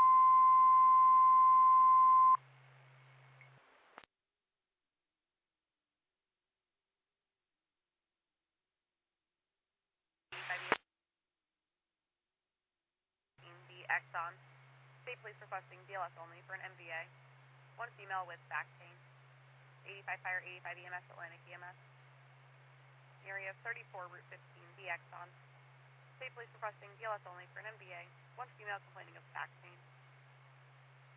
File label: Siren; Lafayette FD